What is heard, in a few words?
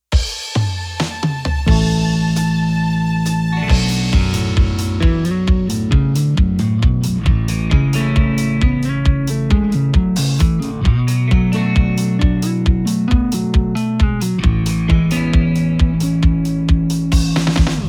Finger Guitars; Reverend Guitars THUNDERGUN MIDNIGHT BLACK